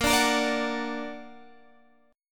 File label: Bb Augmented Bb down Guitar Half Step Down